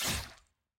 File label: hit; samira